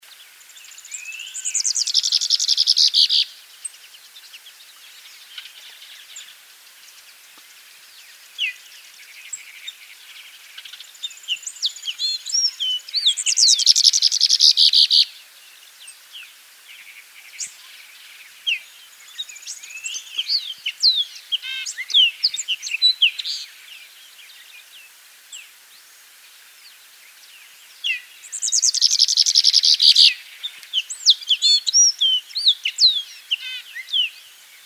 greenish yellow finch

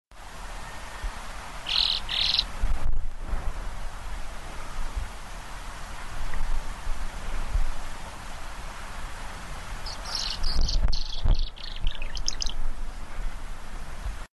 chilean swallow

